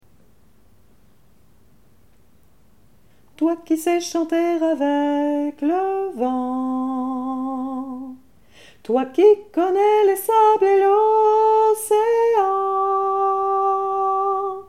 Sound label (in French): la terre soprane